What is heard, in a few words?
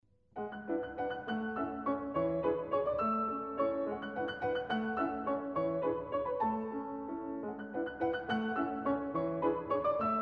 German Dances; Franz Schubert